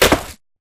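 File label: Bullet Light Ballistic Concrete impact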